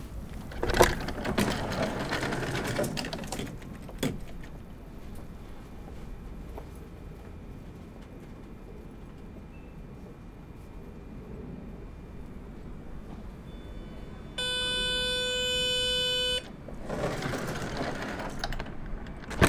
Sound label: Belgian Train Door Closing